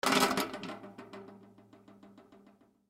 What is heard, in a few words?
Film Light Arri Halogen Set Down Stand Wobble; Foley; SoundEffects-Blastwave